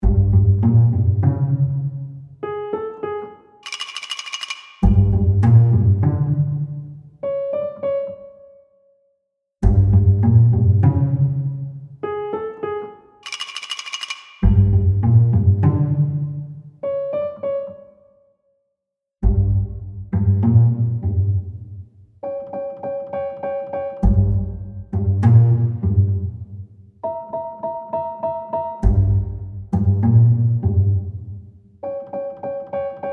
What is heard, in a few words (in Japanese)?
探偵ミスをする; 何かを企んでいる時に流れそうなBGM; アコースティック